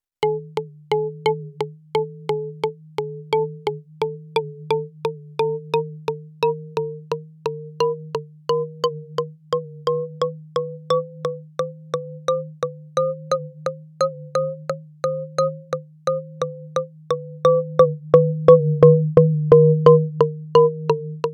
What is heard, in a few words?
Plonk Clang Wood; Intellijel